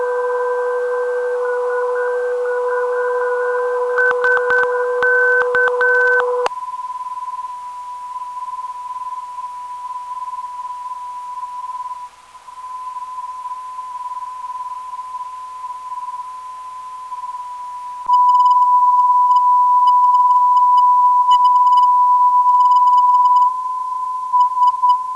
349.5kHz 400 8Hz, ndb; SZA

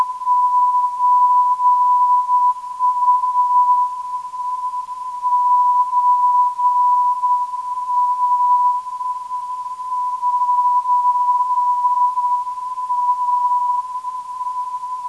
476.33kHz, ndb

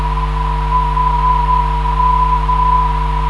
417kHz, NDB

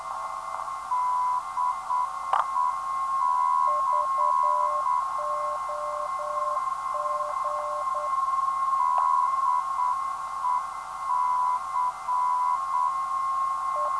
331kHz, ndb; DEC

